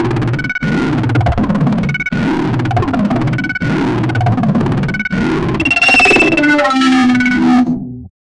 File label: make a super loud smoke, alarm